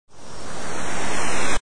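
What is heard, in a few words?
beam ready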